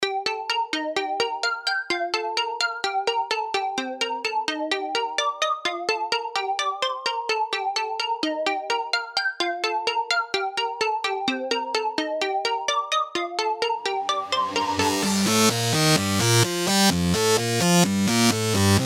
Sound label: Mainroom House Sylenth